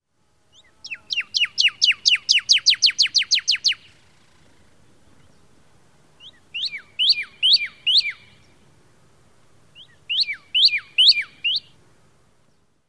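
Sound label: songs, Pyrrhuloxia; TX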